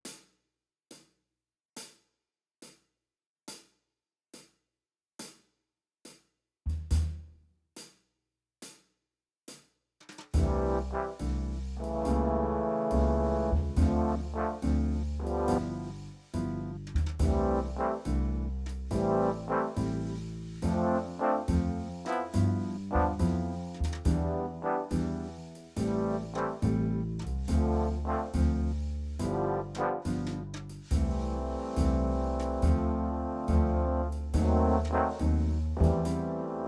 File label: Slow Swing Backing Slow